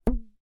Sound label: hair elastic band twang